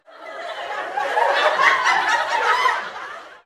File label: sitcom crowd laughing